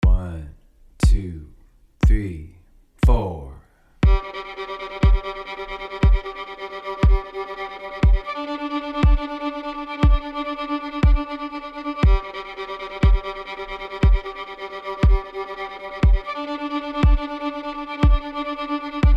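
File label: string crossing tremolo G to D, violin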